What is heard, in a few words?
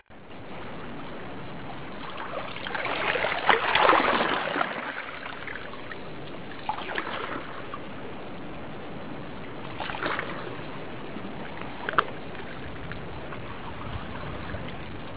waves; Sensorium; kawasaki